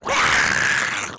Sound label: goblin death